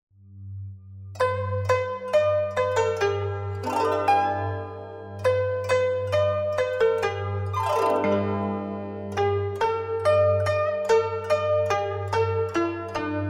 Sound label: Meditation